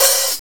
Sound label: D OPEN, HATS; Zero-G; Funky Elements